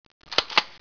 SWAP

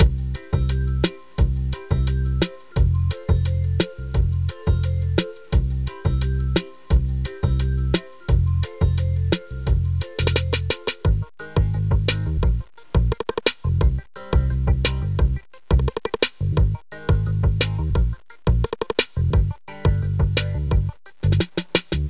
MUSIC

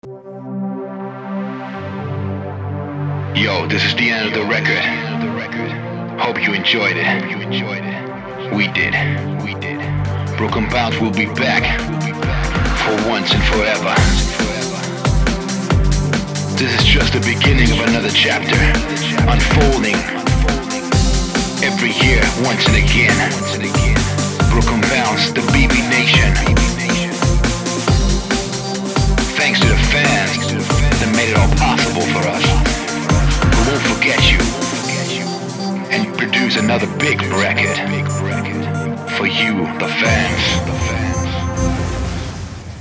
Outro

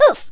oof; Biggles

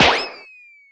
Punch Impact; Dragon; Worms3D

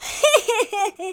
laugh, Hippy; speech

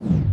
Highlight

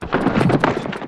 debris; Worms3D

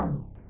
paddle

